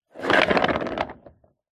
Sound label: industrial dolly trolley wood small on wheels push roll on wood; zapsplat